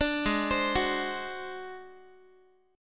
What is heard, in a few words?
G sharp 7th Flat 5th, Guitar; G#7b5; 17,16,15,16,x,x; arpeggiated